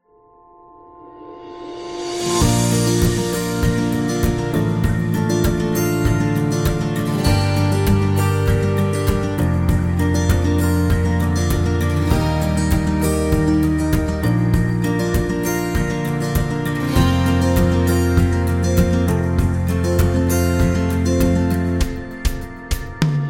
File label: An Acoustic Guitar; happy music vol 9